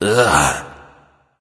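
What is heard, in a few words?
non-verbal ugh; smite; voicepack; houyi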